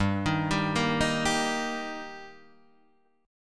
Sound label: G Minor Sharp 5th G, Guitar, Standard; arpeggiated; 3,6,5,3,4,3; JGuitar